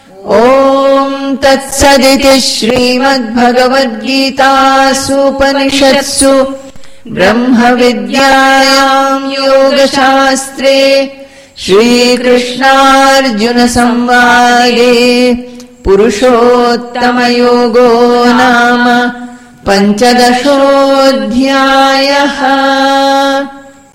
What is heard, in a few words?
Ending Chanting; chapter 15 purushottama yoga; bhagavad gita; conclusion